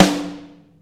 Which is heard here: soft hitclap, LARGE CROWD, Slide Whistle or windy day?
soft hitclap